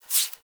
Catbrow Foley Idle Lick Furr; Warframe

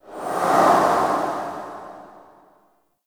Item Look Whoosh, Ambience

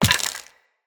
Walk Bone, Footsteps; Operator

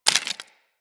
Bullet Impact Debris Wood

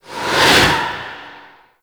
Ship Cannon Whiz By, Flak Cannon; Warframe; Railjack; RailJack Weapons; Ghost Ship

